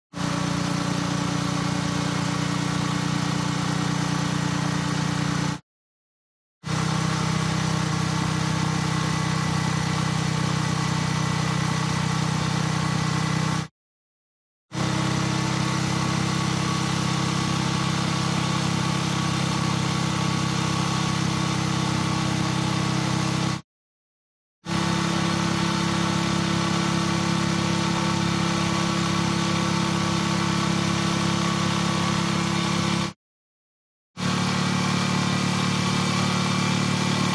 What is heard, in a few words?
TRANSPORTATION MOTORCYCLE Engine Medium Low Constant RPM Ascending